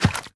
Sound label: scuff, footsteps, concrete flooded; player